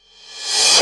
REVERSE CYM.; AKAI S-Series CD-ROM Sound Library VOL-8; PERCUSSIVE

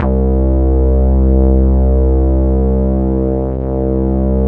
PL MOOG; Wizoo - Powered Nord; STEREO LAYER

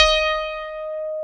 piano ELEC. D#5, E-PIANOS CP-70; Sound & Vision; Gigapack I CD 2

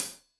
Hat Tight, Hi-Hat, Cymbals; Deeply Sampled Drums

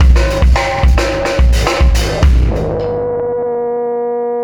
System Overload Beat, Processed Drumkits

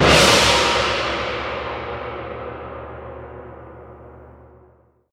Crash, impact samples

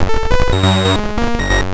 138 F#, pure-synthl, Techno; instrument-loops

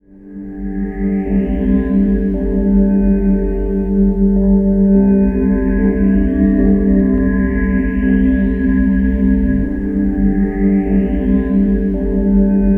Talisman Pad; Sonic Foundry (Sony Creative Software); Loop Noir

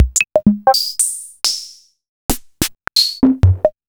CRB Rhythm Boy 480

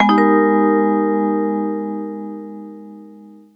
TV Suspense Vibez-G#, 135; Zero-G Kocktail Kollection; Construction Kitz (Lounge); TV Suspense Mixes 135

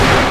HVY ROOM, TECHNO RAVE; Pro-Rec - Synthsonic

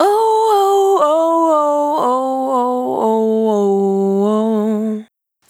Balance, Single Voice Melodic Loops; Singles

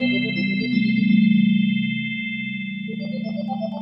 E PIANO 125, Keys; Houseworx

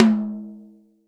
TOM, Real Drum Kit, Rock Kit

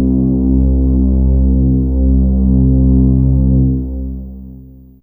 Low Str C2, LoString; Fairlight CMI IIe

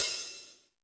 Jazz Drums, E-MU Pro-Cussion; drum machine

